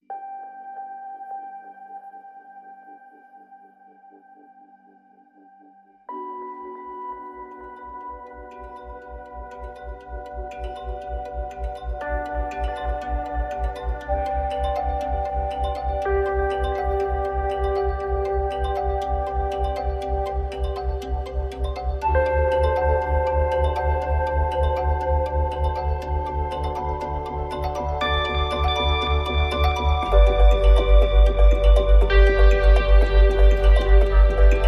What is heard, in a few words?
Mbira